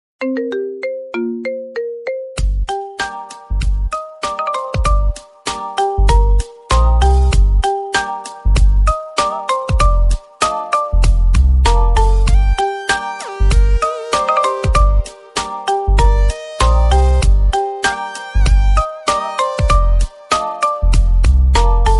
iPhone Ringtone; GTA

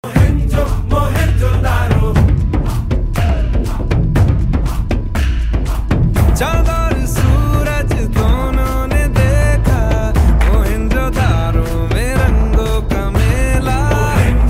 Hindi Songs Ringtone; Mohenjo Daro

